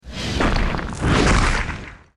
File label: attack; hero